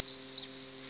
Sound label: bobwhite; upland birds